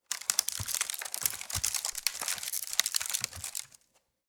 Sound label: Hamper lid push creak and rustle; basket